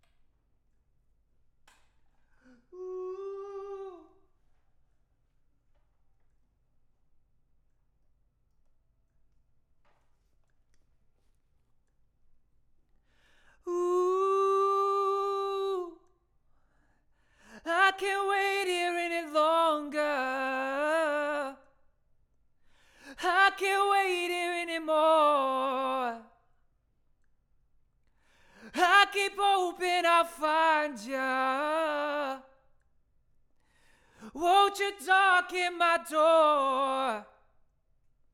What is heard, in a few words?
Vocal